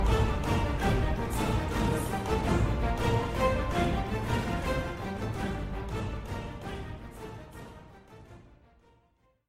Fight Music; UFC

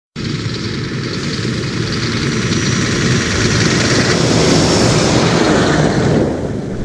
Scania R730 V8

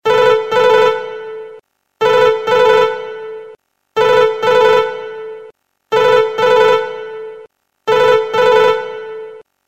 Ringring Bell

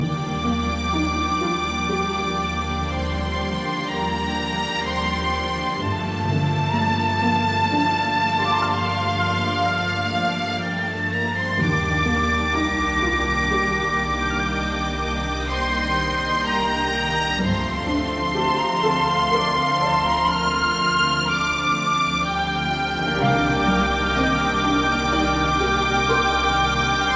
Calm Ringtone

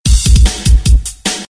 Drum Alert

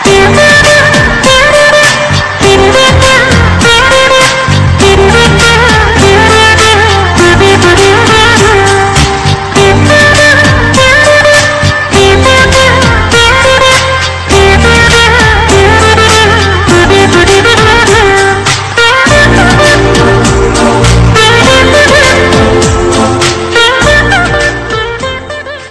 guitar bg tone

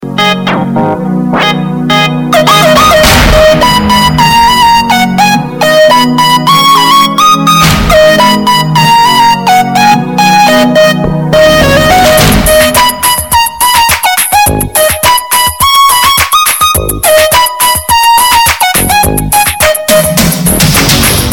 High Pitch

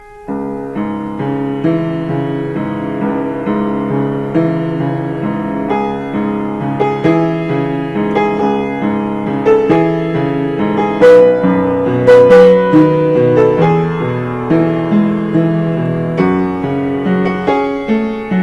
Piano Solo

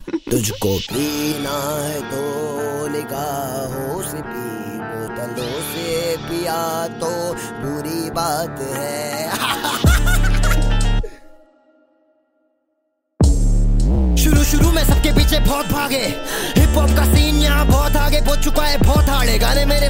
Rapper

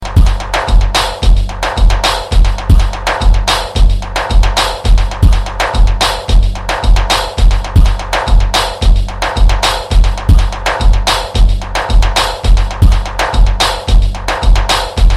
Dj Dhol

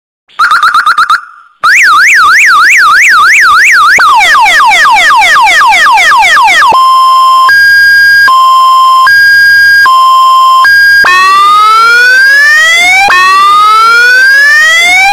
Car Alarm Exlooud

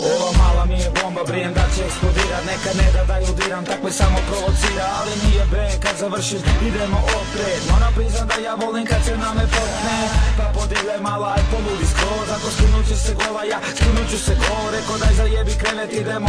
Croatian Rap

